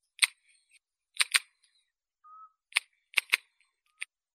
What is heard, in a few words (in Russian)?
spljushka shhelkaet kljuvom